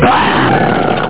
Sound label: GROWL; Multimedia Toolkit